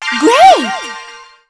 COMBO GREAT

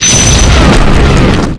skill earth

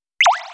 attack

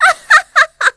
DASH

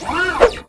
ATTACK

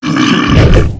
attack

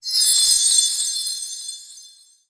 STAR START

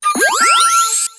SKILL MAGICIAN SYLPH

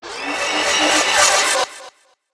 TRAP

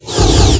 attack

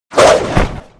SKILL ATTACK

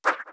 JUMP

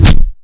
BEATTACK

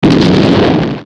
ATTACK

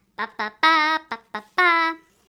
EMOT CHEER

